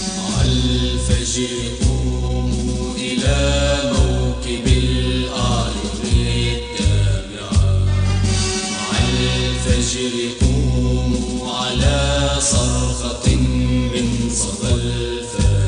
old nasheed; hezbollah

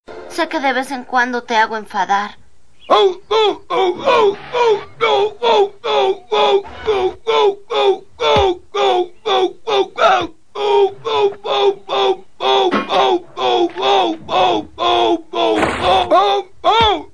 ouch; homero

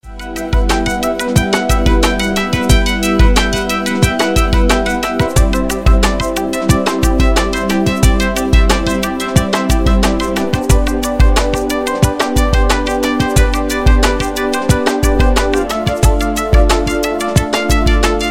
relax music

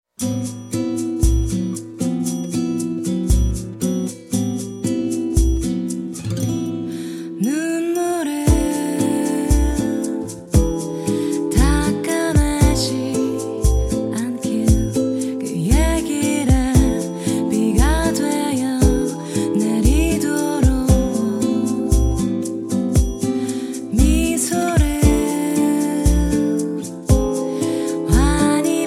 slow kpop song

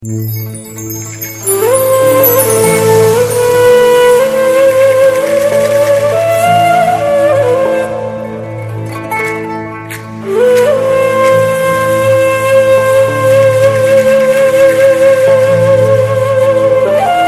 sad bagpipe

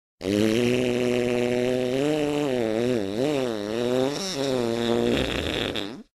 peido fart

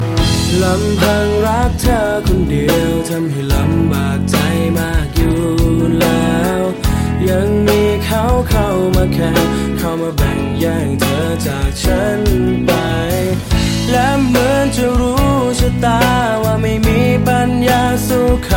thai love song

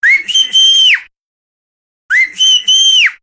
whistle sound

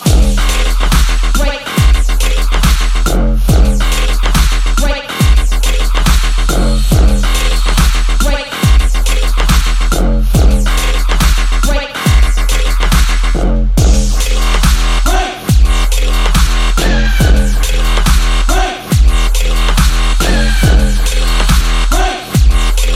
dudstep beats

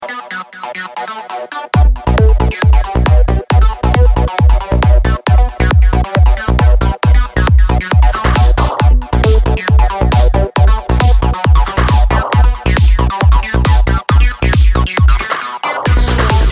nice drop

